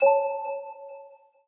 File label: Shimmer; amazon fire hd 8